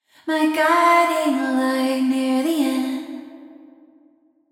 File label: Vocals Rev Verb